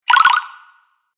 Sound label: Fantasy Game Collect Sound, video game